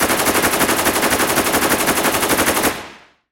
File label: machine gun fire; soundscrate